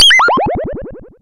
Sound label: SPACE DRUM